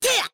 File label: Attack